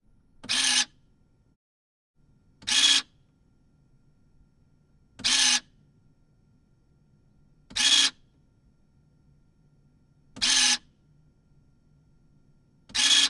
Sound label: textron alterra mudpro 700 4 wheel engage and off